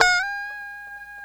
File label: A 5 FUNK SLD, GUITARS, FUNK STRAT; Spectrasonics; Hans Zimmer Guitars VOL-1